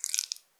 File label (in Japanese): 膣外射精